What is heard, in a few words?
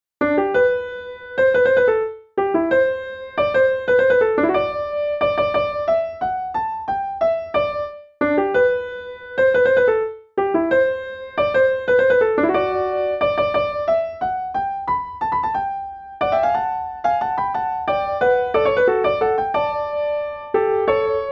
Waltz, Midi; Kiss Me